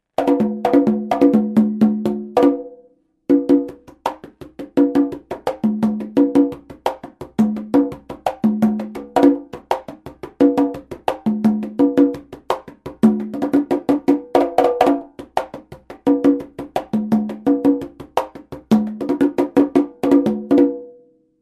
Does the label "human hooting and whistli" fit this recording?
No